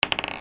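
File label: percussion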